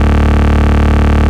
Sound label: Bass D, Root D